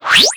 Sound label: Pullout Pulsegrenade